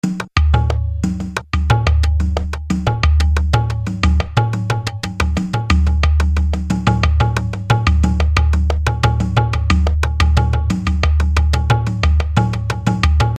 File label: Storm Ethnic Drums